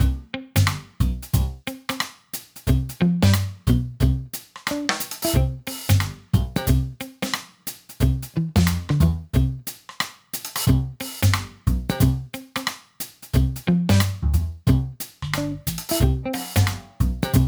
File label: Chase Menu Loop